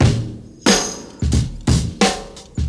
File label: 89 bpm drum beat d sharp key